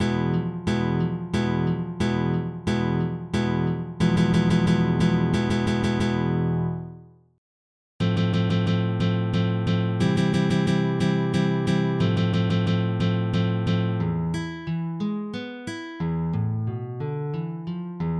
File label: Soleares, guitare